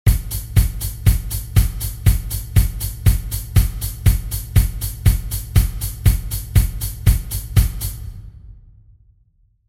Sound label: pattern rim